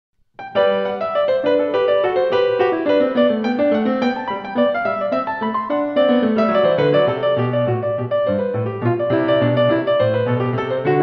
Courante; Bachkonzert Marktplatz; BWV816

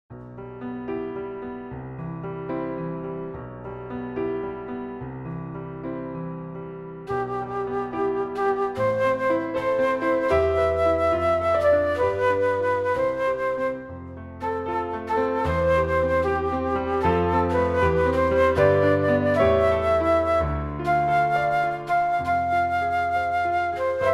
Piano Flute; Strauss Richard; Zueignung

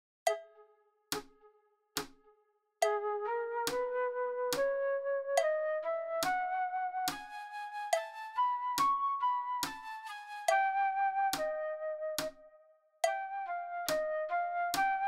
flute fast; Uebungs-CDs; Playbacks

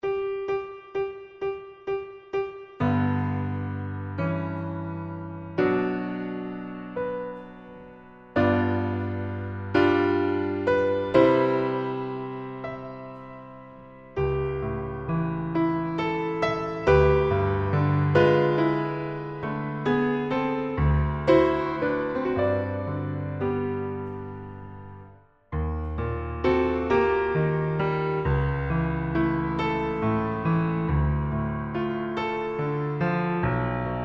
D-Dur Piano; Romanze Floete Klavier; T130; Reger

